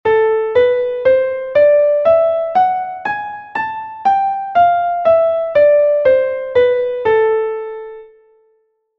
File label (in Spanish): la menor melodica